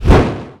fire shot; netent

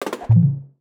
quick Stop Bounce

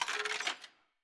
scatter Counter Up; netent

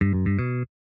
double Up Bar; netent

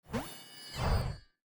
big Win Autro

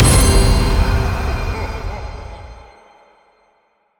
medium win; netent

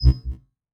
slot quick stop

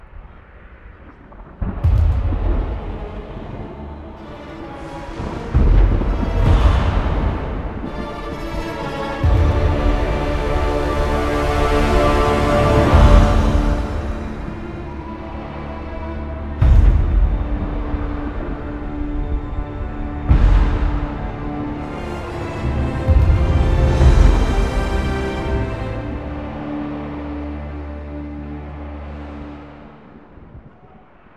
free Spin Outro Amb; netent

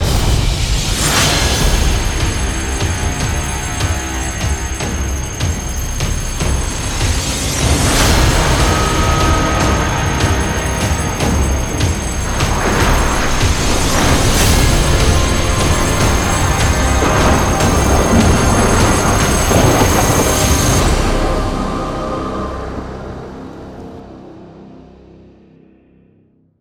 Big Mega Super Mega Win Amb; netent

